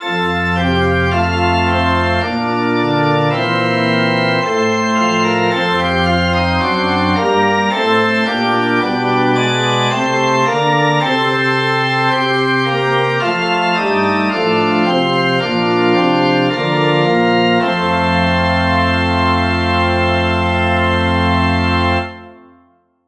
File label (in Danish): forspil; gud skal alting mage